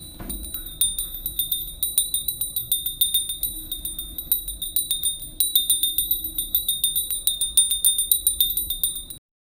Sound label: temple bell ringing softl